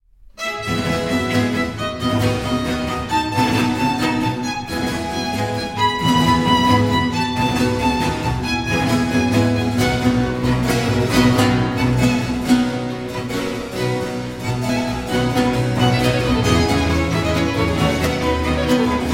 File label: concerto for cello in f major allegro